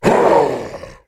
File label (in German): Monster